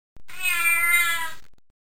cat

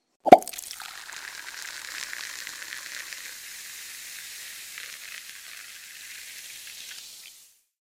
Champagne

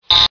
BUZZ

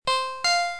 Ding Dong

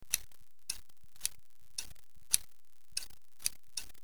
SCISSOR

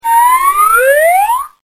slide up

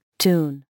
tune

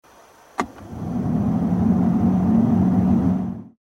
W123 Diesel HVAC on

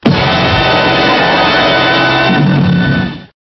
FANFARE

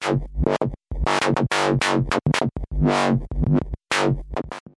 synth

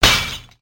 Window Breaking

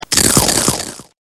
CRACK

